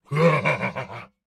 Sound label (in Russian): Смех; Турбосвин